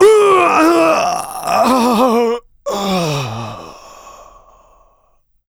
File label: death, player; Doom